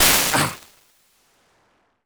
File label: burn; space kitty